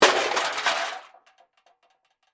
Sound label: Crash Metal Object, Crash, Metal, Objects; Settle; Crash Sound Effects; Blast Wave